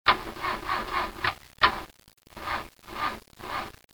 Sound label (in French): Panne de démarrage, bruitage Transports